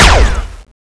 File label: crossbow; weapons; crossbowex21; cstrike